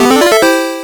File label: fanfare